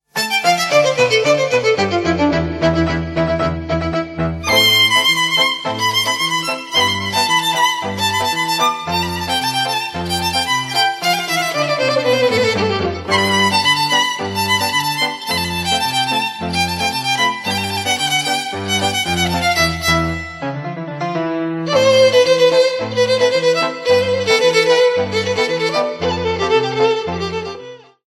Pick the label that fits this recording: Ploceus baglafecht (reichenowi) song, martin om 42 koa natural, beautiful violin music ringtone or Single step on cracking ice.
beautiful violin music ringtone